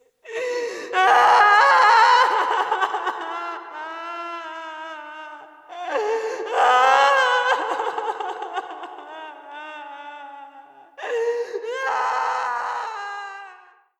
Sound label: Cry Woman Effects Fadeout